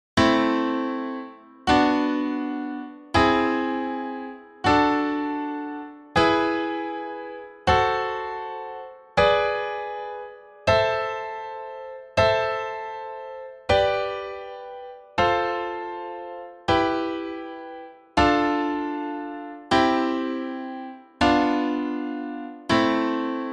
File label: Melodic Minor Chords